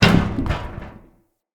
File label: Ruins Machine Surface Impact; Props